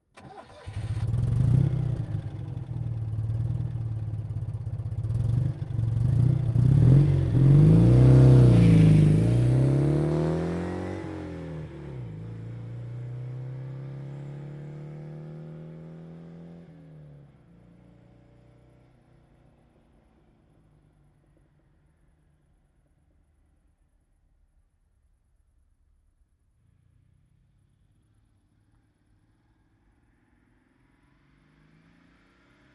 citroen 2cv mod round trip start depart pass arrive stereo ortf roadside